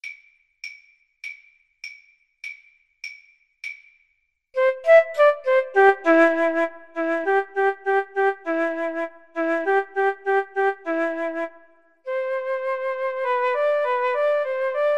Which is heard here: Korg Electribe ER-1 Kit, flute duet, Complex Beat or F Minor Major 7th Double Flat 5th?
flute duet